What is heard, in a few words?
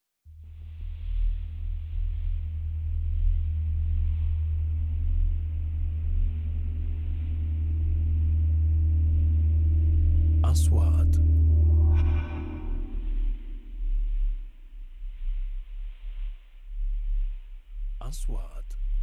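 3D SFX Trailer; مؤثرات تشويقية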